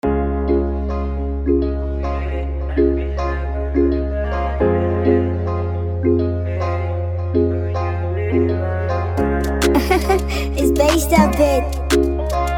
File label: Instrumental